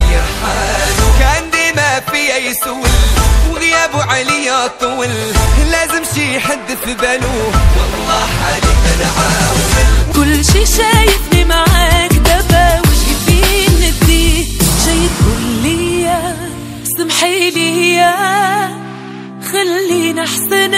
moroccan ringtone

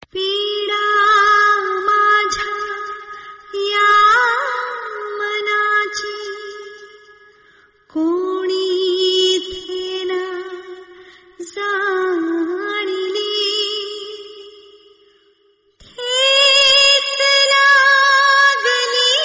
sad ringtone; pavitra rishta

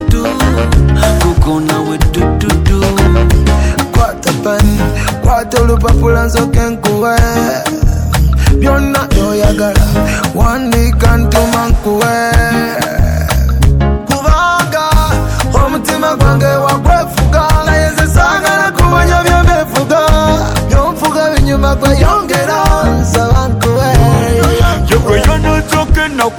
ugandan ringtone

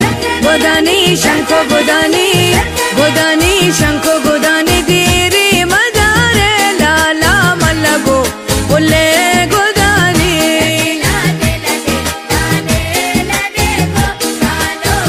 oman ringtone

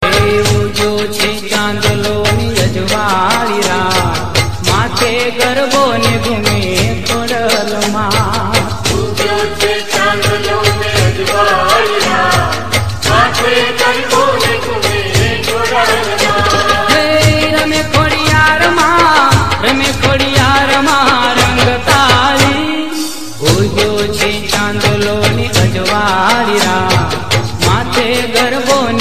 garbo gujarati ringtone

